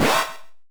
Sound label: Retro Alarmed Stereo UP; Alarms Blip Beeps; GameSFX